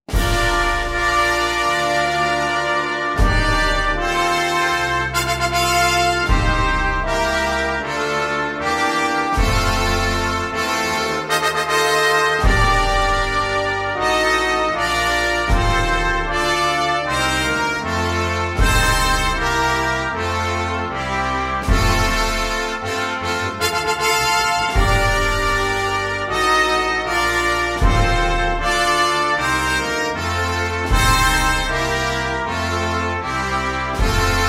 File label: Abkhazia National Anthem